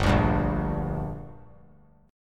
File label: G Minor G down, chord; strummed; JGuitar